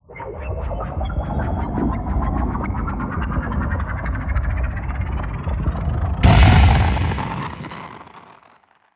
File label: A rocket breaking ice; 384 Sound Designs